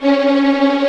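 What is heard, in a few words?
Vio tremolo C#3; Digital Sound Factory Virtuoso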